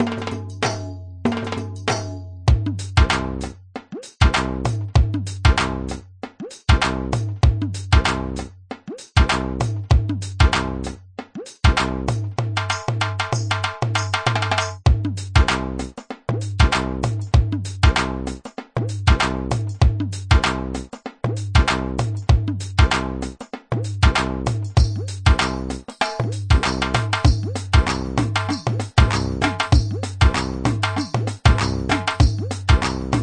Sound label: bhangra mix, groove